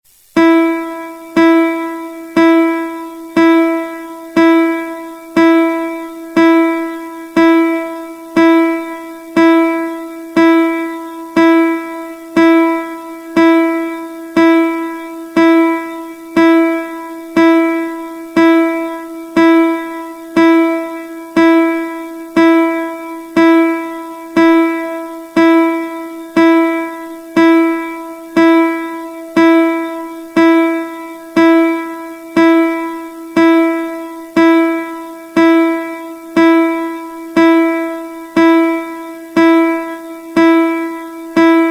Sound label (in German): Gitarre Saite e